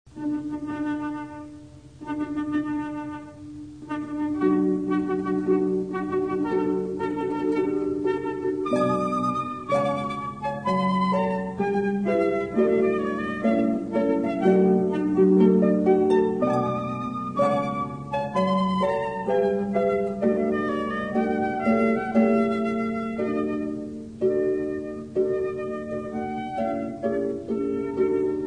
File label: march harp flute; mendelssohn